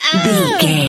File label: voice female hurt pain